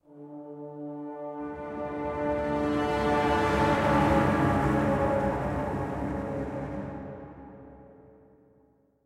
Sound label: Music Action; Galleon Set Piece; Assassin's Creed Chronicles China